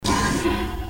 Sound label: mech move